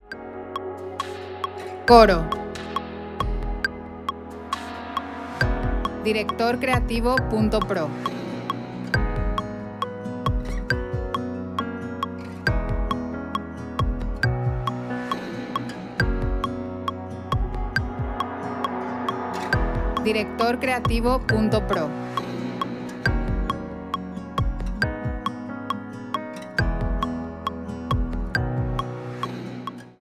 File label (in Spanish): 68bpm, 4/4, B